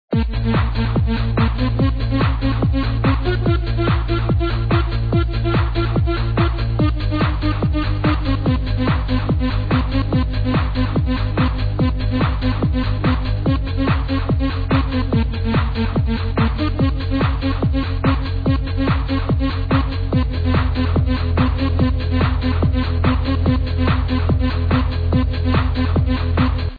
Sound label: a lost trance tune